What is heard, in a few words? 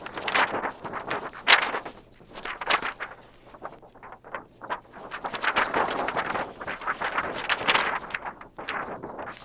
paper flutter